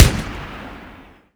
imperial carbine 1shot

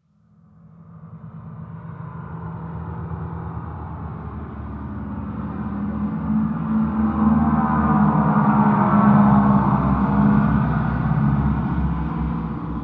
airfield hangar passby; War Thunder

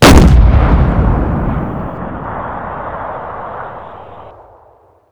Vehicle Weapons; Red Orchestra Ostfront 41-45

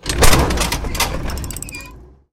track repair, tanks; War Thunder

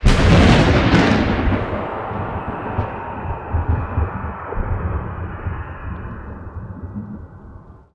ambient battle; World Of Warships

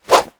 swing; Rust

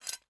ui pickup metal; Rust

